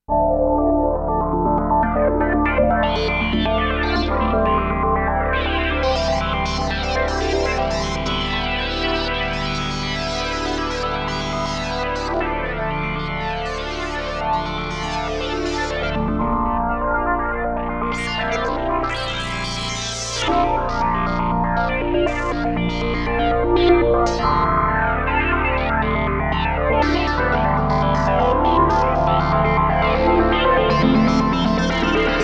Pulsatronics Trigger Buttons Mod Wheel Foot Control